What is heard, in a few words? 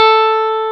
DRY GUITAR; E-MU Formula 4000 Series Vol. 3; Analog Odyssey